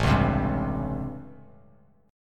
A 7th Sharp 9th, Bass Standard; A7#9; strummed; JGuitar

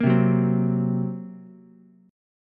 Bb Suspended 2nd Sharp 5th, Ukulele; strummed; chord shape; 3,0,2,3; JGuitar

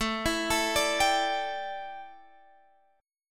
A 7th Suspended 4th, chord; A7sus4 arpeggiated

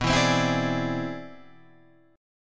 C Augmented Major 9th B

